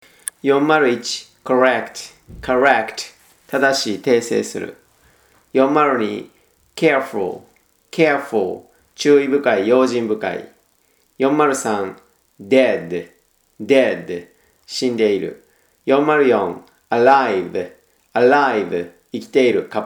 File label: tango